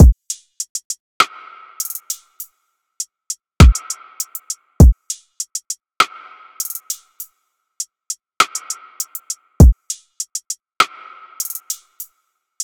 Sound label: Drum Loop - 100 BPM; Wake Up; Cymatics; Infinity Drum Collection